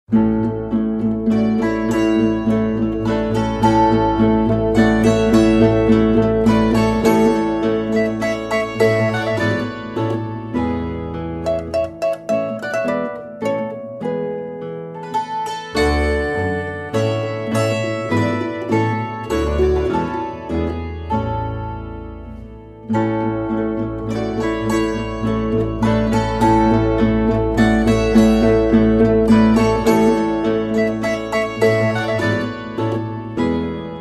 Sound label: Andante